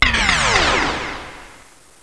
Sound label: beam up, space